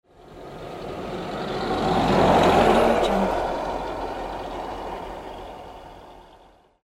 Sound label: old car passing by